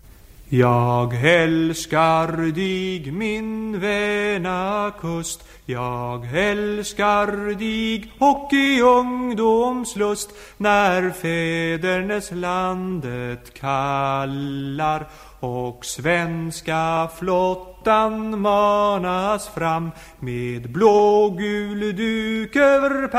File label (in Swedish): blekingevisa sjungen